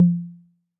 shoot; dunk shot2

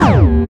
wrong; panda love

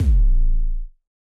die; dunk shot2

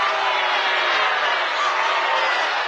win; wrestle jump

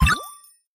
coin; idle evolve

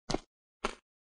steps; 3d royale

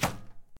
arrow; save the monsters

